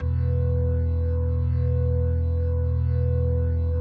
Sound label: E1, B3